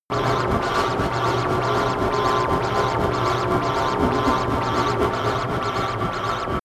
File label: sci-fi machinery